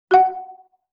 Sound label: Xylophone Flam F#; Vintage Cartoon; Musical Elements